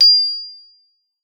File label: GLO D6, GLOCKENSP; Xsample VOL-4